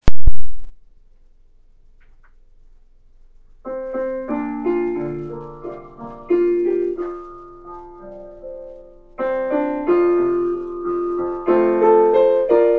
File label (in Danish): maa din vej - akkom + sopran